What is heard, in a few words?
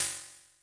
hi pitch bluzz